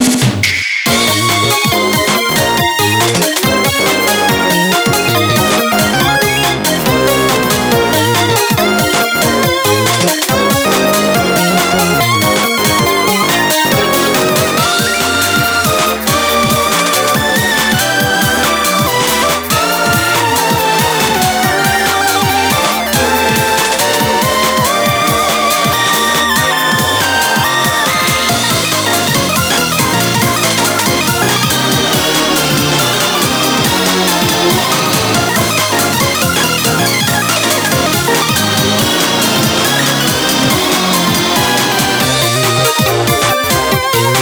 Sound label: Bgm Reach